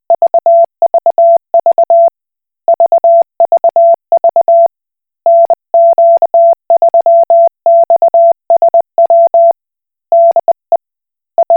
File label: Koch Morse